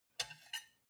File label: cutlery take from plate soft; berufe; gastronomie; soundpool